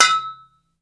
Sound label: Sound HIT; ROBOTS; Roboty